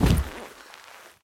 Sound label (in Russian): korotkij chyotkij skripuchij odinarnij zvuk vistrela iz avtomata